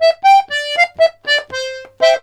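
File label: Accordions, polka; USB Soundscan vol.40; AKAI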